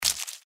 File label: mantis foot; npc